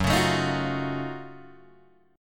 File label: Gb Minor Major 13th Gb down Guitar DADGAD; strummed